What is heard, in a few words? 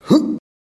Jump